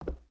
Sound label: Foot Wood Boot Walk; footsteps